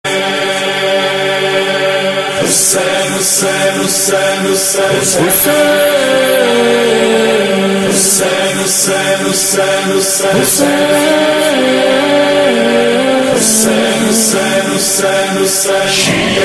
nauha ringtone

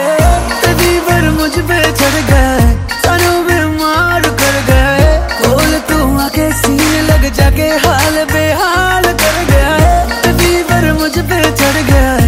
arabic ringtone; islamic ringtones

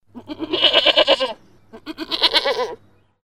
goat laughing funny ringtone

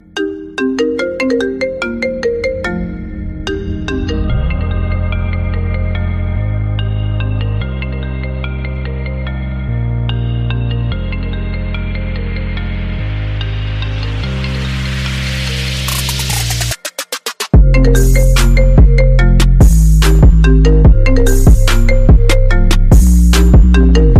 apple iphone 8 remix ringtone

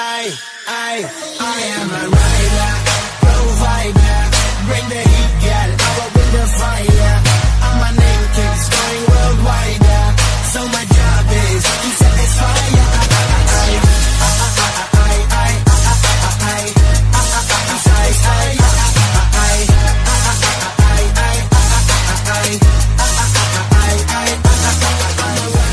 english ringtone

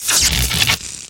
electric shock ringtone

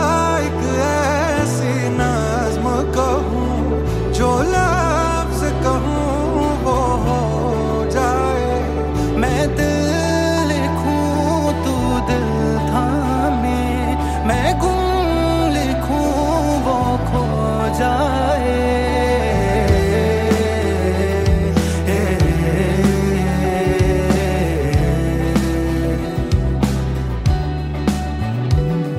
music ringtone

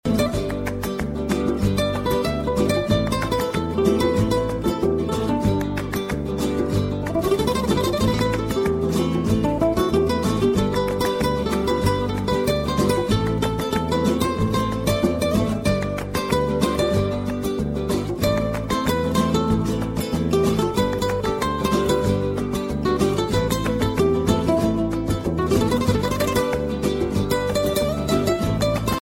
spanish guitar ringtone

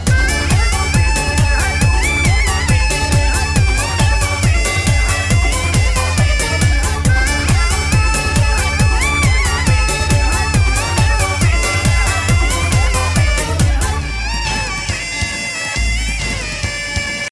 flute ringtone

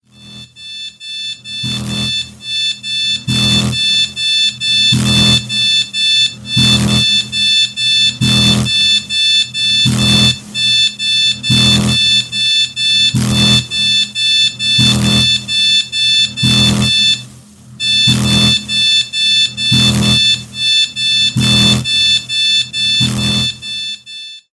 snoozealert galaxy s4 ringtone

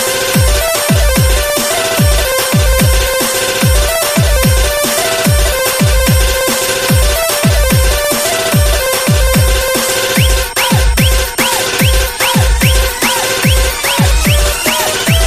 dj remix ringtone

